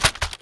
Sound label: keyboard big, collisions; FEAR